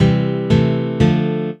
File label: Chord